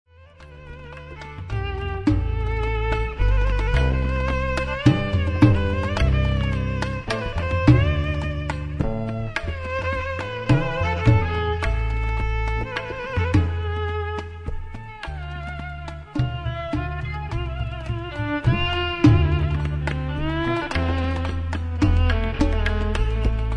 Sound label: maqsoum; thala